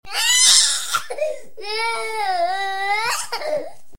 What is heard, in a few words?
cry; CosmicRage